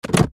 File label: Puzzle game tile swap; video game